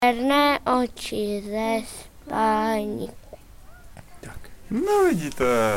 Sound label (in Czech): slavíci; ornitologický víkend pro rodiny s dětmi; září